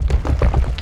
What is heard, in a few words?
roll